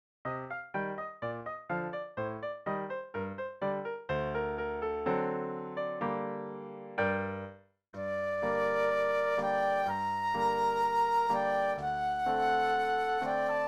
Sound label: Hungarian Dance Flute Duet and Piano; classical; Brahms